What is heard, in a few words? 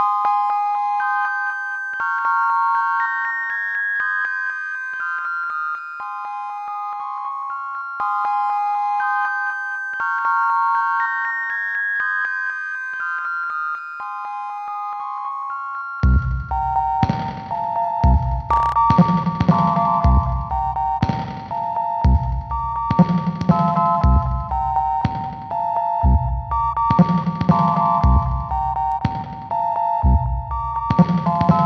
Sine wave